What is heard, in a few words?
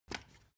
step ladder wood single; schritte